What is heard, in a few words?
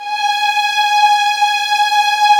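violins; String Master Series; Vlns 6 mf-f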